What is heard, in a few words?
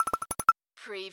CARTOON FAST POPS